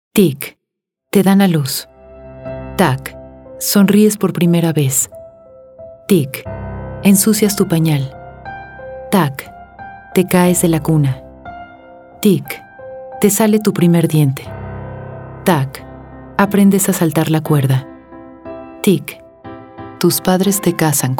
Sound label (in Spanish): Radio, Musica, Marcha